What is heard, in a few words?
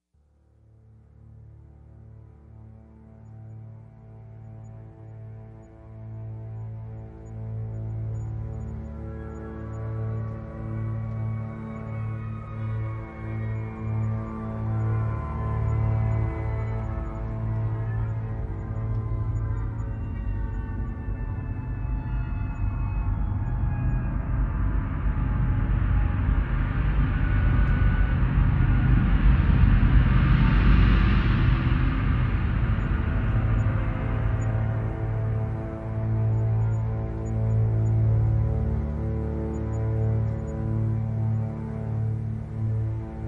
Strings Only